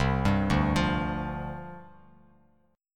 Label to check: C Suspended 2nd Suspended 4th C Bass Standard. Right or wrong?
Right